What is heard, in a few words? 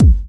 BD; Best Service; Dance Mega Drums